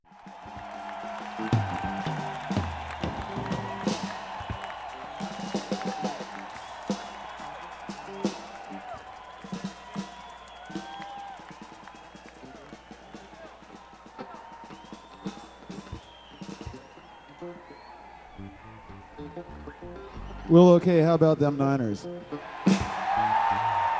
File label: Tuning